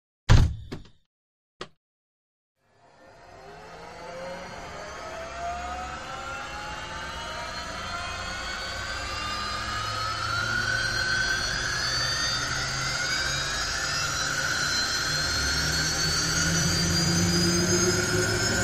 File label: Hydroplane Interna